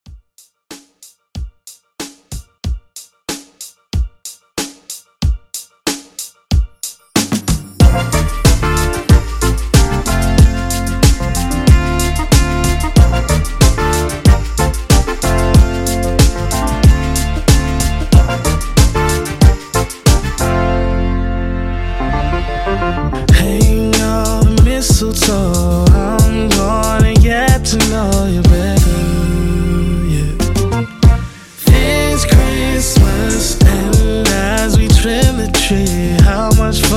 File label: Holiday Re drum; Christmas 2023